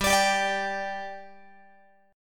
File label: G 5th G, Guitar; G5 strummed